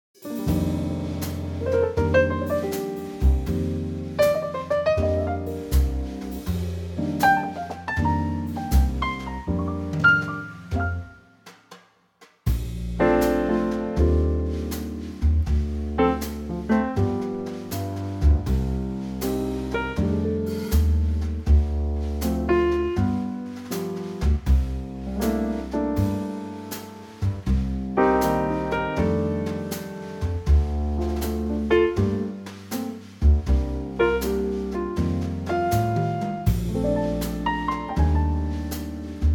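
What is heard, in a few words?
Bb Trio, male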